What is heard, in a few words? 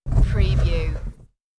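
Short Cart Roll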